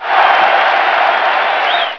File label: ovation; wnflash